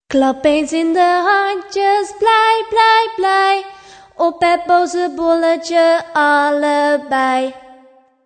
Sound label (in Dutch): klap hand; Klap eens in je handjes; Dutch children songs